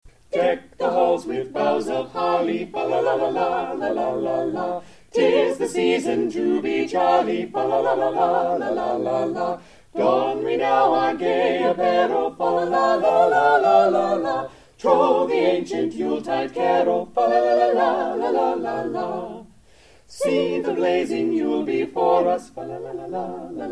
Carolers, Deck the halls; Cleveland Carolers

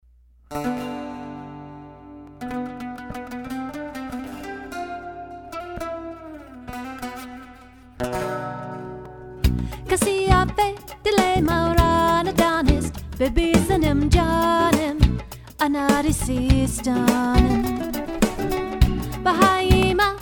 Jazz Fusion Band

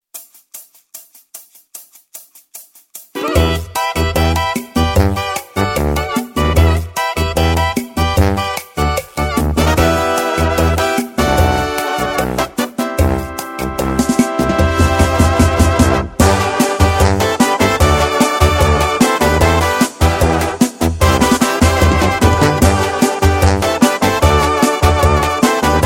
Banda; Chicago

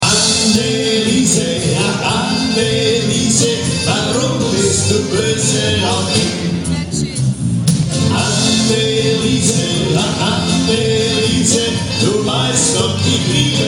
German Band; Orlando

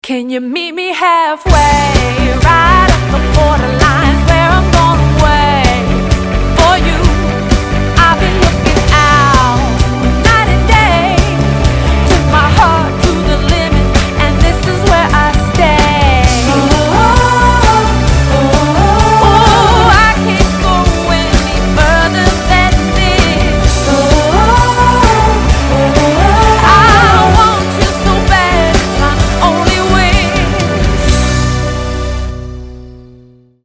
Cover Band; San Diego Cover Band

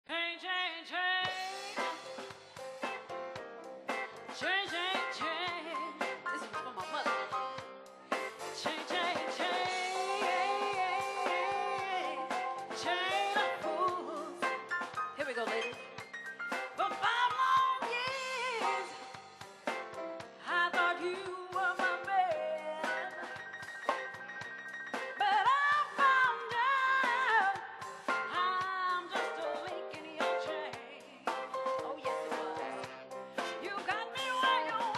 Variety Band; Atlanta

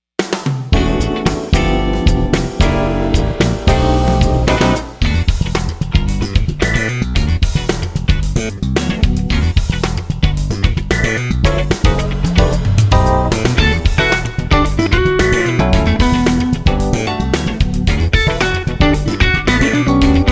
Jazz Guitarist; Atlanta

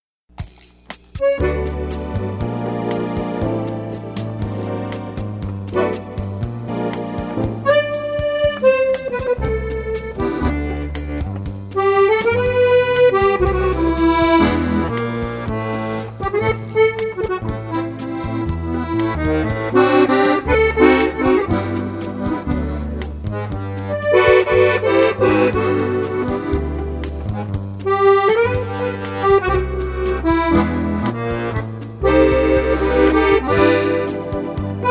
Italian Band; Denver

